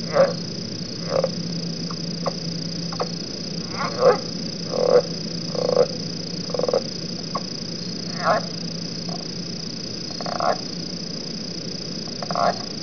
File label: southern leopard frog; frogs toads; wildlife